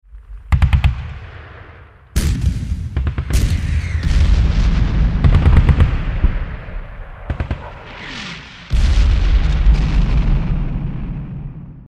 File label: Ambient Distant Battlesound Loop